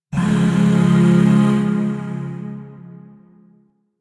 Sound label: Shawzin Phrygian Chord F; Music; Chords; Warframe; Zariman Void Shawzin; DE